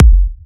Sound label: Punchy Kick